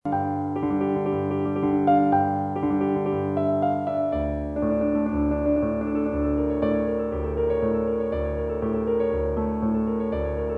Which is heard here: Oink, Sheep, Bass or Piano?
Piano